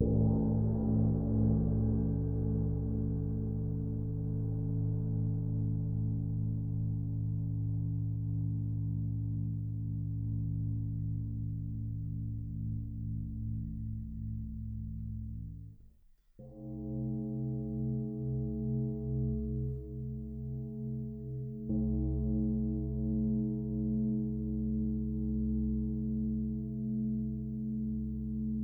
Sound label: cymbals frequencies